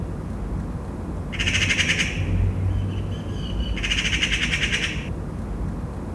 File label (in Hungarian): kétszer angyalföld, szarka; termeszetben; allathangok